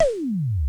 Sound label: E TOM H, DRUMS; Crypton - DrumBase5000